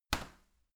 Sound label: garbage container light lid shut